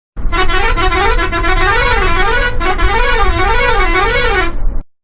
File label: Milan Travel Bus Horn